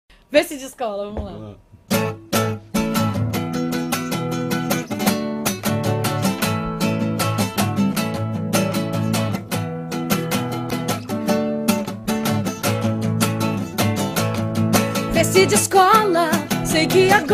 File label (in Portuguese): voz violao